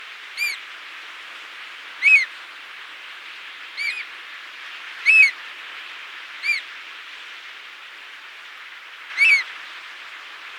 Sooty oystercatcher

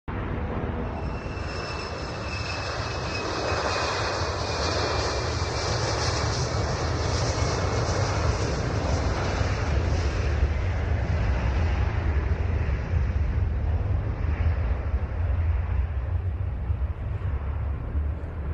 Tupolev Tu-154; توپولوف ۱۵۴

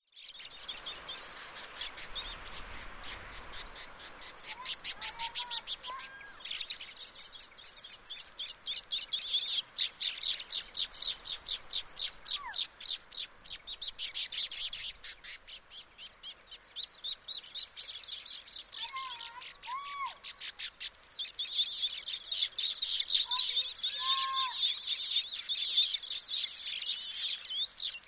Baya weaver

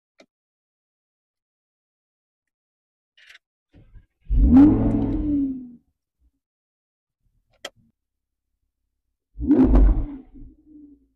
Pressing car switch

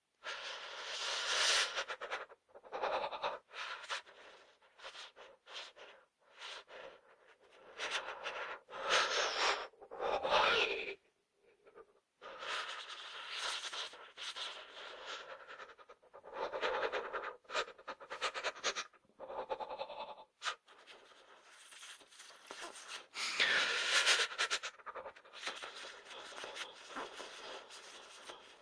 Shivering from cold

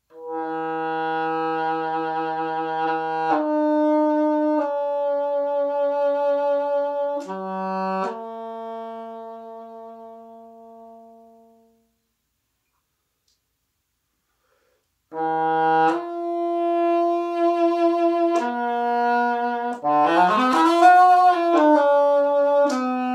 Heckelphone

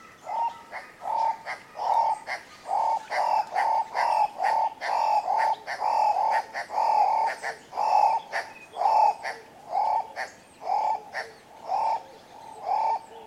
Guinea turaco

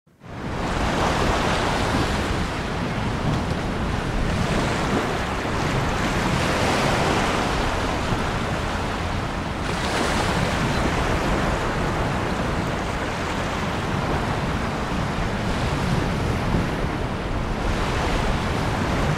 ocean at night

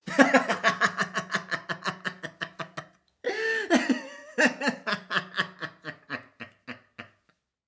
Laughing Satanic

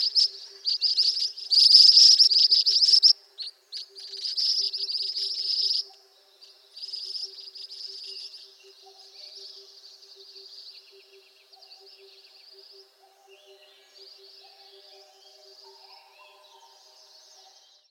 Pericrocotus divaricatus

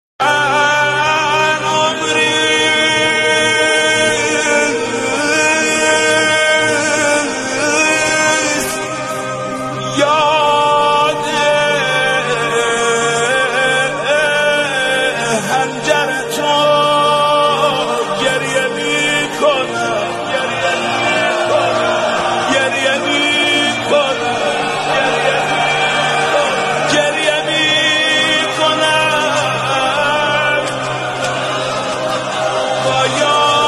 mourning cry

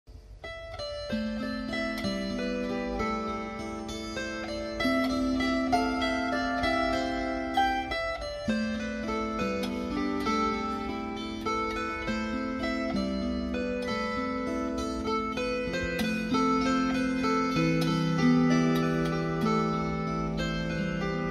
Gusli

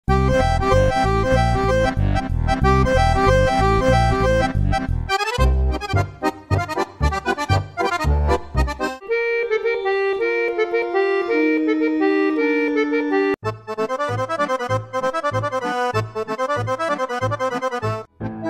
Accordion; آکاردئون

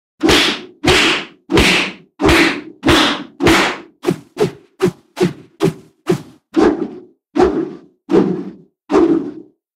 Club; چماق

